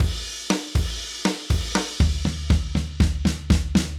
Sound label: Drums